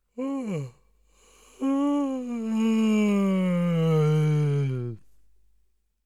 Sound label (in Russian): Zevajut